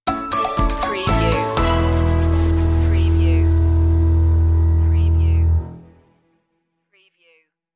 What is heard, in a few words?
walking on air stinger